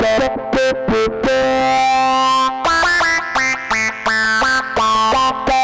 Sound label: Sustain Sync